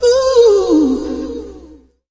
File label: "ooh" SUNG